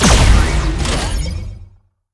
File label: las beam shoot